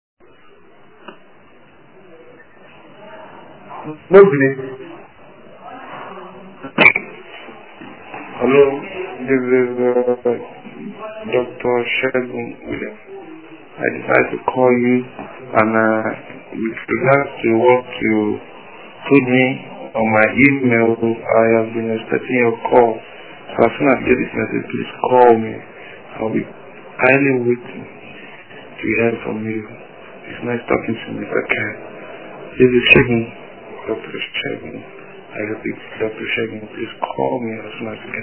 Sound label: Yawn